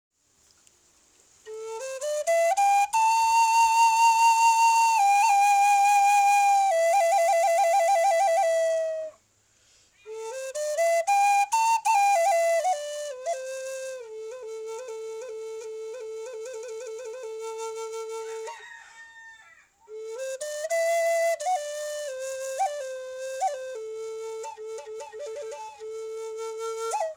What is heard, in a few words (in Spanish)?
Flauta afina en LA